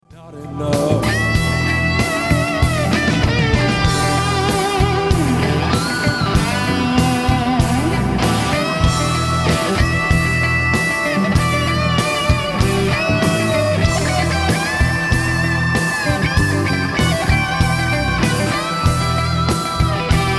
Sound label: Solo Sovtek Big Muff, Live Broadcast; Sovtek "Civil War" Big Muff; Live broadcast 2016